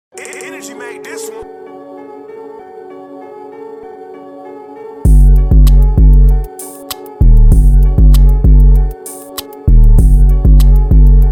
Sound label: Instrumental